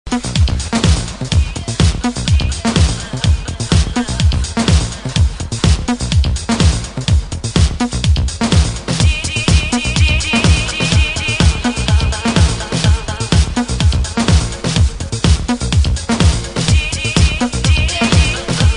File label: drum idea 303